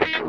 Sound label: wah snip, Funk Gtr & Wah; PlatinumPhattCD2; E-MU Producer Series Vol. 8 – Platinum Phatt